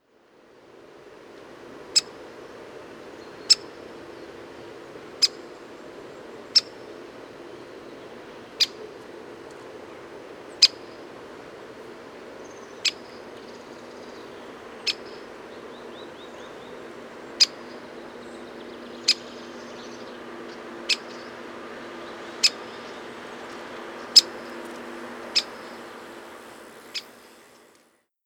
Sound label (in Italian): picchio rosso maggiore